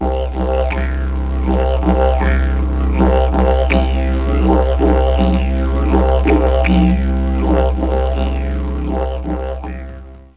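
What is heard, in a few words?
DIDGERIDOO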